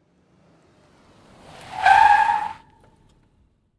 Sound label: Tire Squeal, Transportation, Automobile, Tire, Squeal, Car, Approach, Stop; BlastWave